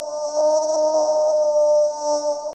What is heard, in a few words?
mosquito